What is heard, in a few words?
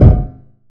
epic metal soft